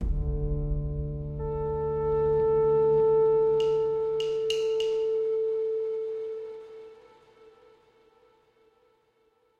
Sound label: Killed Stinger; Naan Per Grd